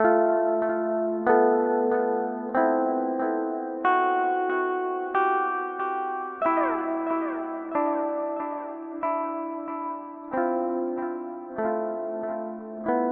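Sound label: Avalanche Run V3 Stereo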